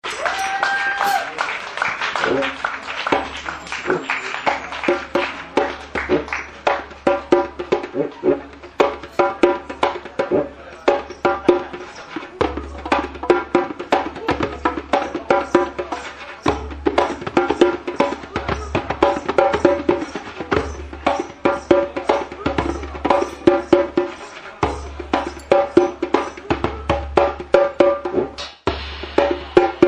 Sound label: Djembe Intro